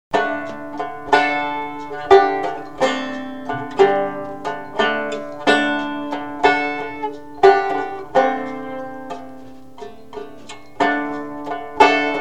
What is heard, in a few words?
banjo hookline